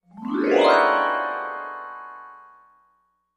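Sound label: Music Transition Vibraphone; mallets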